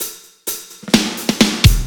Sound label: fill